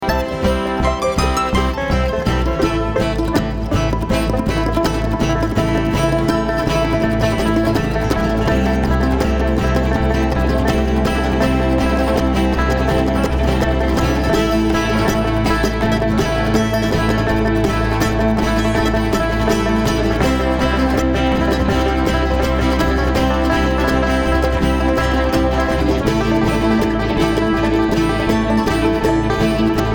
Traffic Jam

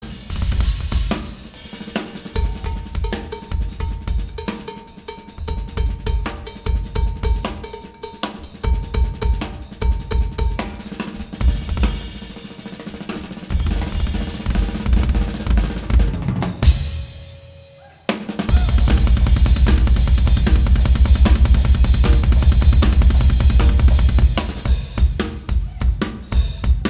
Drums

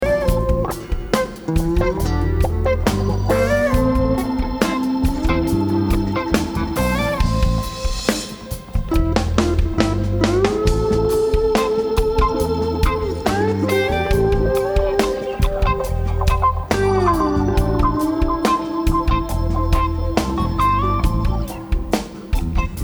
Reggae Jam

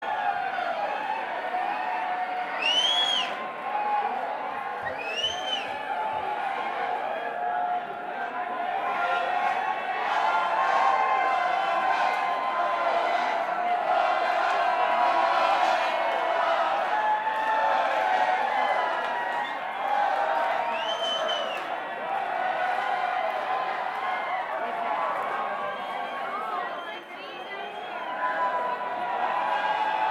Banter Crowd

